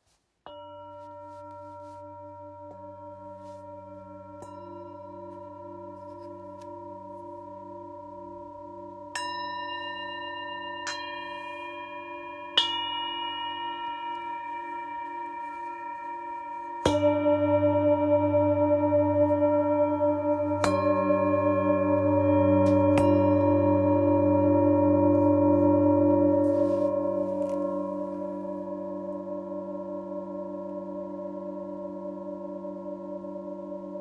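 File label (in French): Set 3 bols, bols chantants tibétains; merveille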